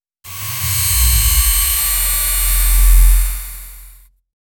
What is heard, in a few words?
speed boost sound effect, motion